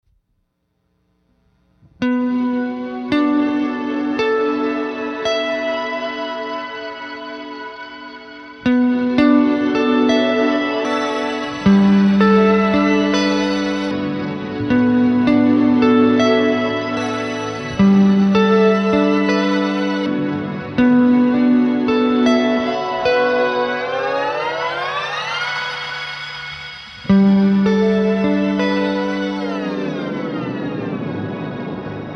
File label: Guitar, Meridian, Cosmos